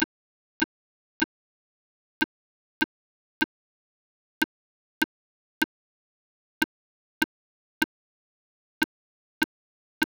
Clarinet vs Ideal impulse

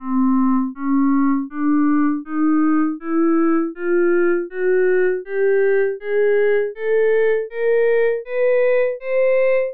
pythag chromo sc

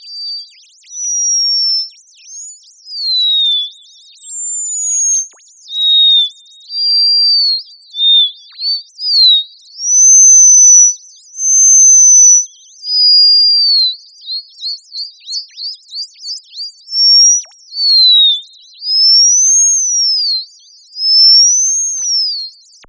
extra wren time

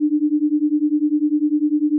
am sine

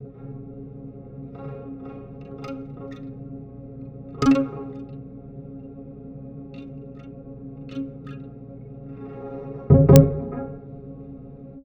mistery tube

